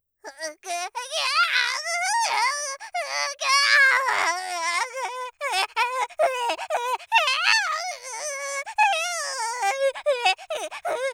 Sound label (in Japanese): 赤ちゃん （男児） ボイス